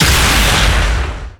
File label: Enemy Factory Active Shot